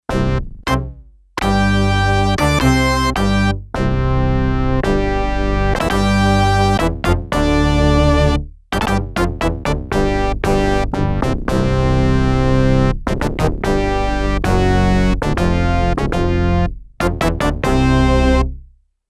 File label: Orchestra, Yamaha DX11; Factory Performance Voices